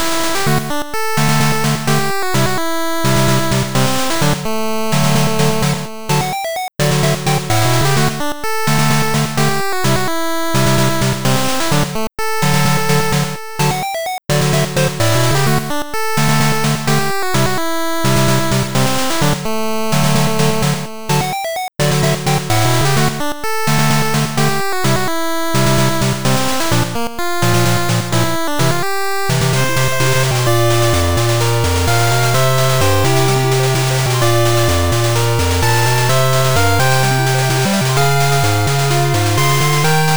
8bit Treasure Hunt

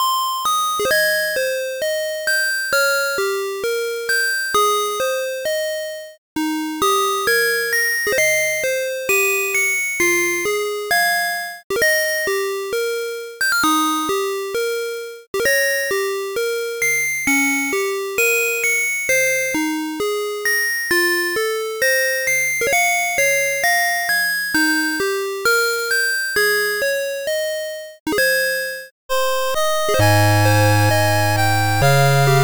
8bit; 【8bit フリーBGM】オルゴール風の感動的でドラマチックな曲; ゲーム風の感動的な曲です。遠い日のやさしい思い出を振り返るような、そんな曲にしてみました。コード進行は「悲愴」のものをほぼそのまま使ってます。

